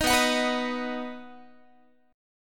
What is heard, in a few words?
B Major B, Guitar; strummed; 18,19,21,21,19,x; 4A,4E,5A,5D,5G#,6C#